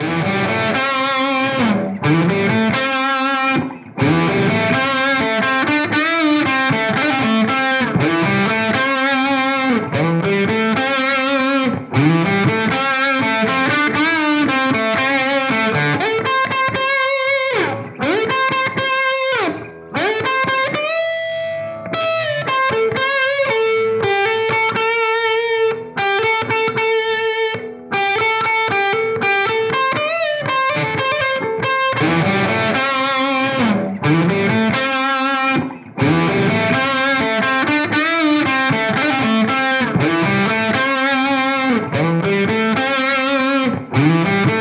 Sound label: harley benton cst-24 rock mesa lead bridge